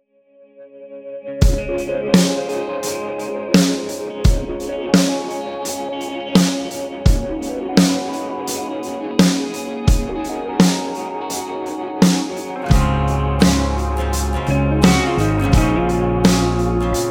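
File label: mahogany 65x13 snare, drum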